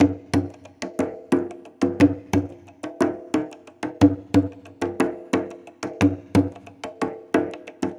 sounds of polynesia

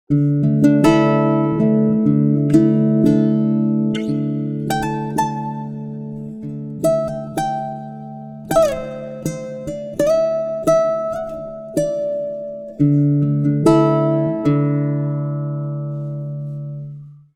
guitar, pitchshift; prosoniq time factory mpex3

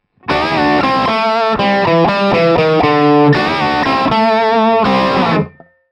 pod x3 plexi lead 100; line6

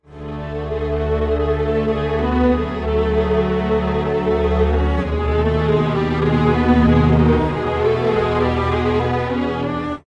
strings, timestretch; prosoniq time factory clear scale

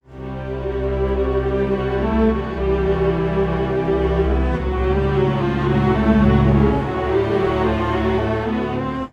strings, pitchshift; digidesign pro tools xform

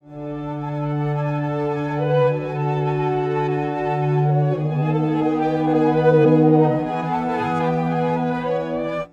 strings, pitchshift; izotope radius

